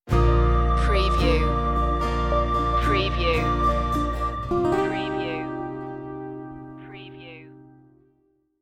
landscape colors stinger

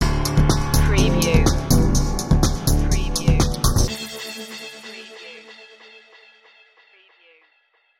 sting

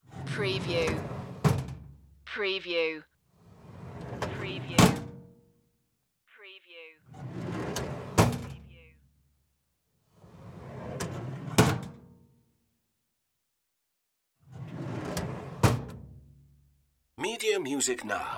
metal drawer open and close

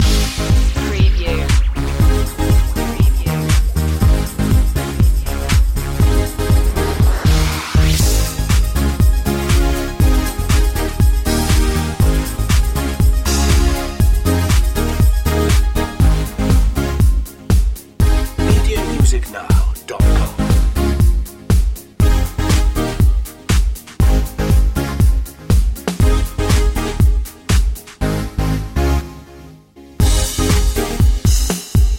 wanna dance with me loop

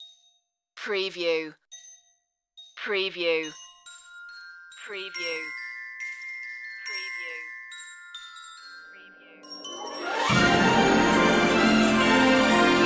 longer intro no choir

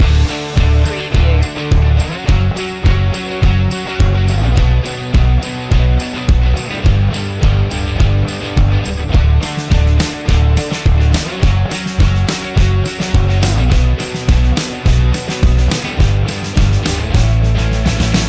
positive indie loop

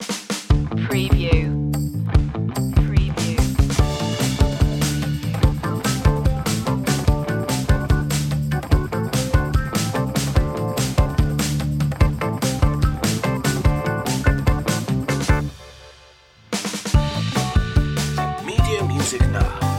driving fast groove

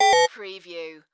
Chime Modulated

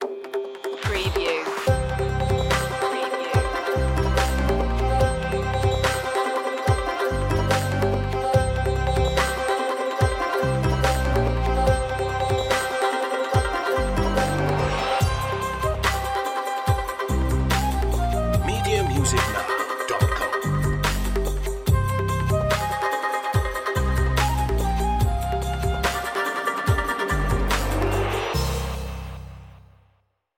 indian chase

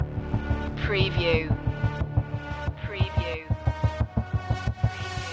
the breach loop

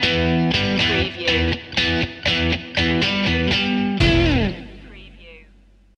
bikers bar stinger

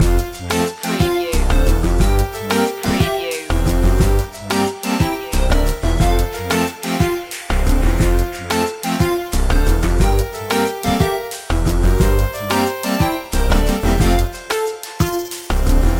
retro video game ferris wheel loop

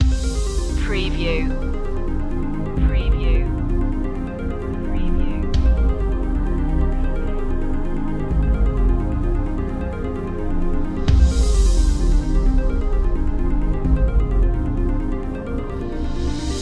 outbreak loop

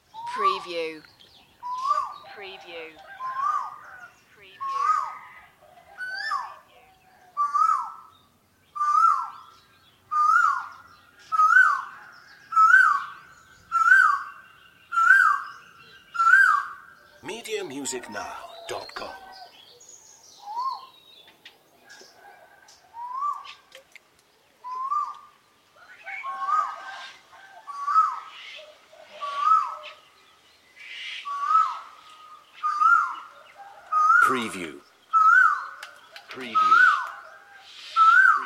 koel bird call loop

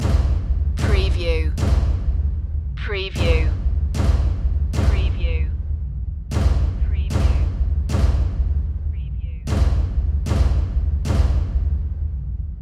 quake loop

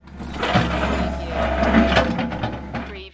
Heavy Metal and Chain Rattle

